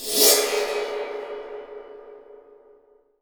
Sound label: RECO RECO, Definitive Percussion